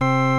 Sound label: 60's Organ